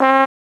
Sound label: TRUMP; CMP - Analogon; THE PUZZLE